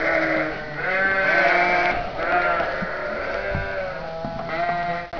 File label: baa lots